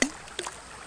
plouf

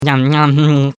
MANGE; MOBICLIC161

